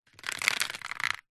nature water drips short sudden onto glossy paper bag